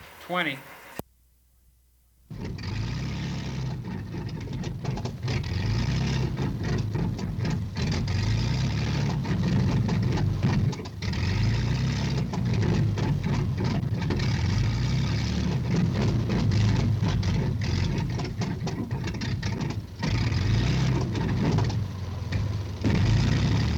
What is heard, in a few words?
Biplane Engine Sputters